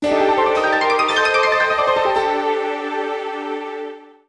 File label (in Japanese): ジングル：詩人; Brandish 4 Tower of the Sleeping God